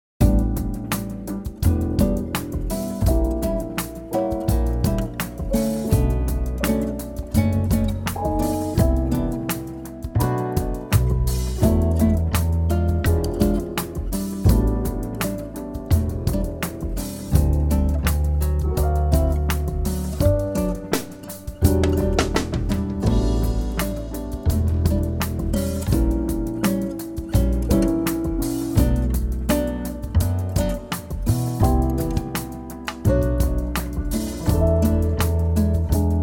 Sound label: Classic Restaurant Jazz - Jazz Bar Chill Music